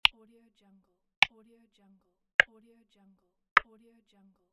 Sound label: tap